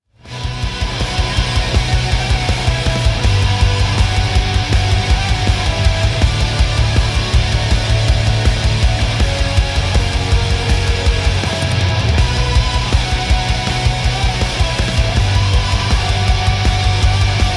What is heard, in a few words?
guitar riff